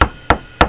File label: knock